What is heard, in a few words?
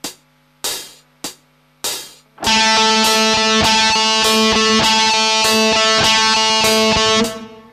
accent